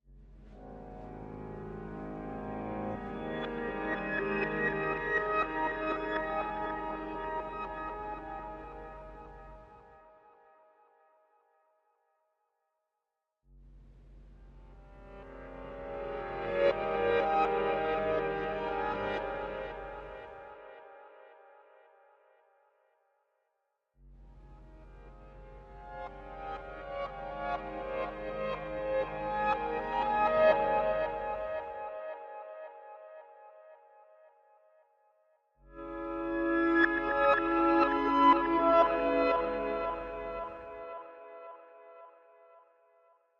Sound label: Reverse Piano